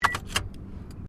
CAR TOYOTA COROLLA KEYS IGNITION REMOVE, hatchback; 2009 Toyota Corolla hatchback; Cars (Sound Effects:Vehicles)